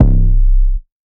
808 Bass Cut C3; Slate 808 Deep Dive; SD Signature 808s

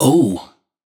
adlib "Ooo" wet, Raw Vocals; Slate Digital Omnivox Vocal Sample Pack; Adlibs

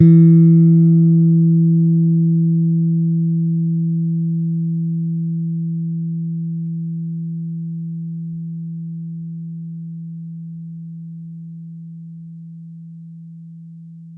Bass Long High E, Electric Bass One Shots; Slate Digital BASSHOLE Sample Pack

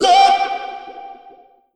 Omnivox oneshot G, Vocal; One Shots; Slate Digital Omnivox Vocal Sample Pack

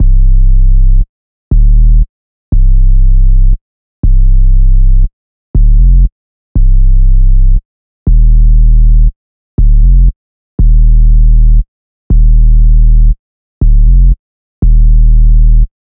808 loop 119 Cm; Slate Digital BASSHOLE Sample Pack; 808 Loops

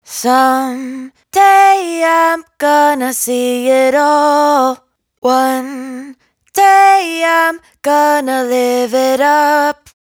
hook 92 C, Dry Vocals; Raw Vocals; Some Day; Slate Digital Omnivox Vocal Sample Pack

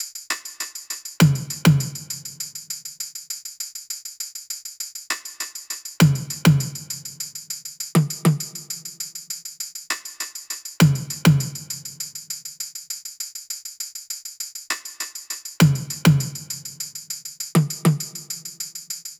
perc 100, Drum Loops; Top Loops; SD MIDNIGHT Sample Pack; Slate

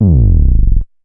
Synth oneshot Moog Slide Gm, Synth Bass; Synth Bass One Shots; Slate Digital BASSHOLE Sample Pack

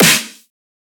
snare super; one shots; SEISMIC Drum Sample Pack; Slate

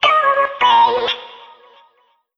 phrase Ebm, Vocal; Slate Digital Omnivox Vocal Sample Pack

